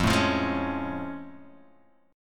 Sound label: A Augmented Major 7th F